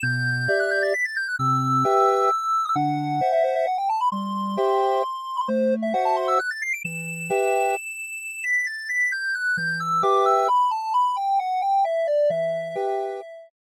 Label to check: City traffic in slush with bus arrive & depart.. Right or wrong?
Wrong